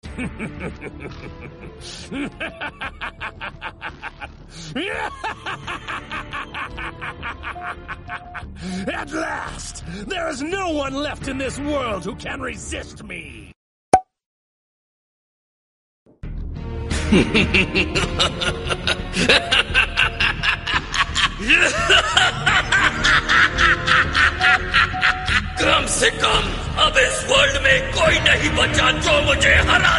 evil laugh english song; goku black voice

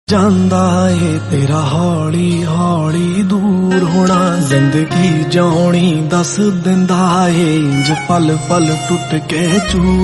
punjabi song

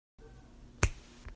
snap of finger

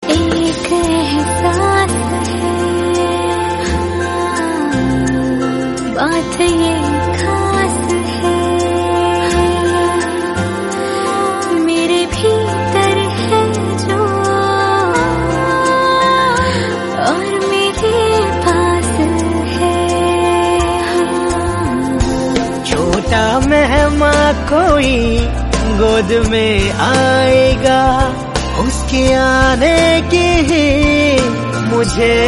hindi song

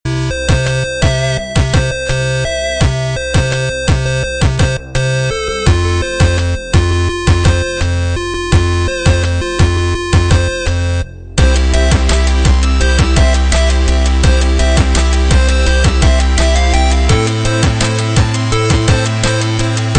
bitcrushed bounced harmony

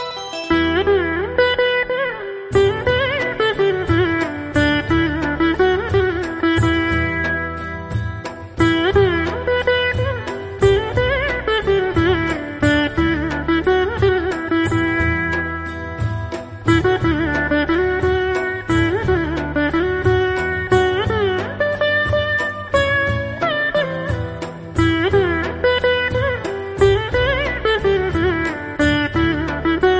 veena instrumental; manchi manasulu

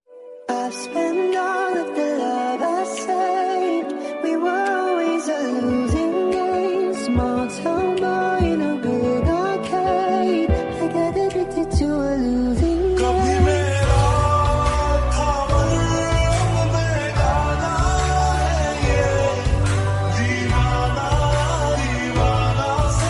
lofi remix song

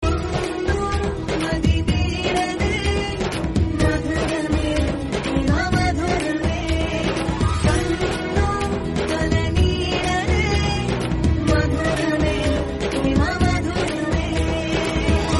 serial song

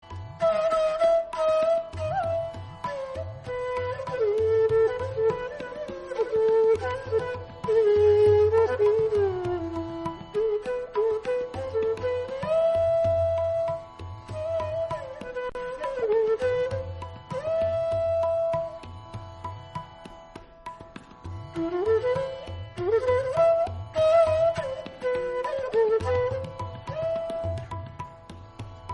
flute instrumental

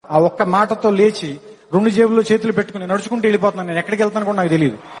bgm theme

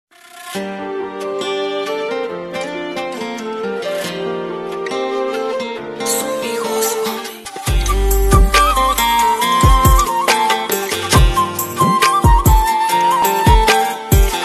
Flute

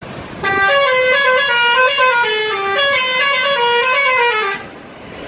private bus horn

